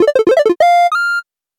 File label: Success Sound